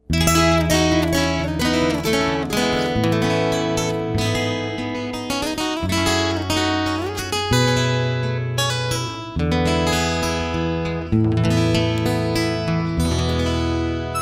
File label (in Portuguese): cordel